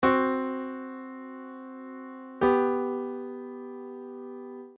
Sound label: aug 4th min 6th